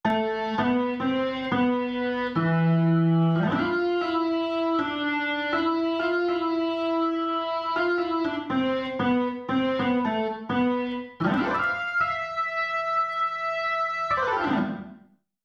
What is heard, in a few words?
KEYBOARD